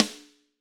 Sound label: Snare Crack